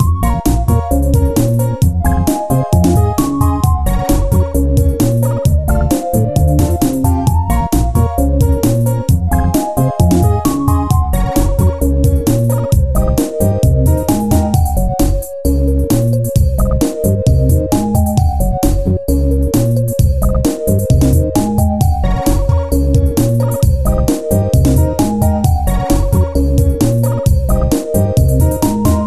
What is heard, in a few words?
ringtone